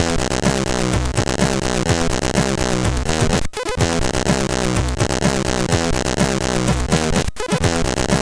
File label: sid CSG8580; HVSC; Acmeee